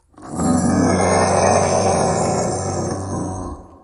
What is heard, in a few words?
T-Rex, dinosaur roars